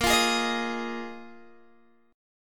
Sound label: A sharp 7th Suspended 4th A sharp, Guitar Open G